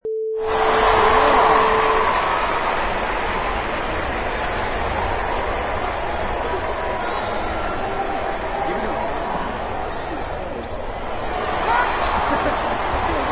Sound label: Arena Crowd Noise with Announcement